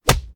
Pirate Barrel; Rabbids Multiverse

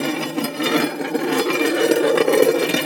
spinner Grinding; unsighted

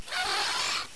Scorpion Dead

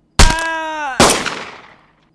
Bomb

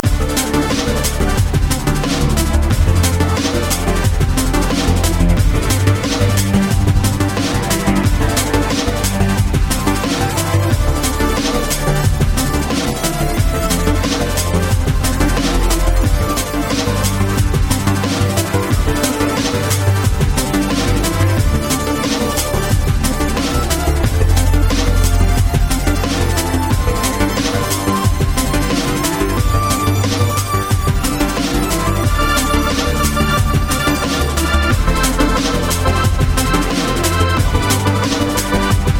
Music Fire; Soda Dungeon Lite; Android, iOS, Mobile, Online, Windows

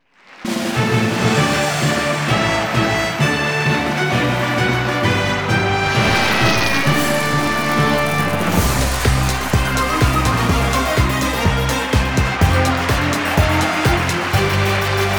Intro fanfare; Buzz! Brain of the World; Buzz! Le Plus Malin des Français; France; PSP

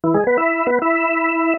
Slamdunk; Burnout CRASH!; PS3; Xbox 360

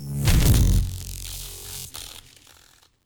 Electri Coil

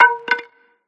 Baseball; Sfx Baseball

